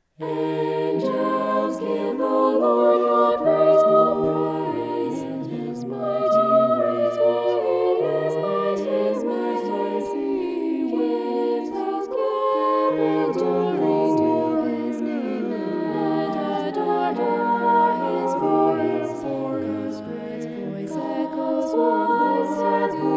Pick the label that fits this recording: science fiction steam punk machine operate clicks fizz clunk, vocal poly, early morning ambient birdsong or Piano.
vocal poly